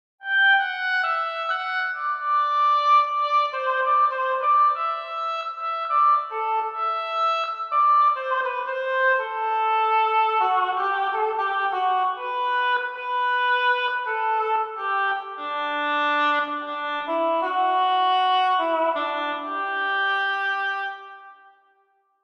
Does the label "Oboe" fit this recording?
Yes